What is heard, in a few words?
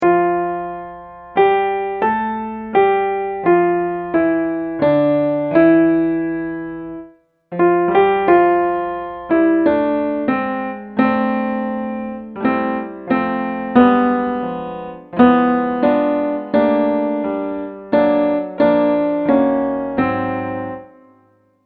smile alto mix